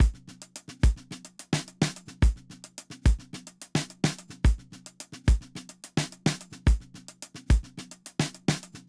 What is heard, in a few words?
Linear funk 108 bpm, drums loops; BASEMENT DRUMS VOL.1